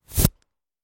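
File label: sounds of endings outro, disappearance fading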